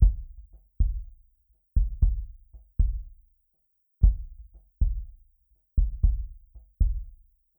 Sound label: Solomon LoFReQ, bassdrum subkick; mikros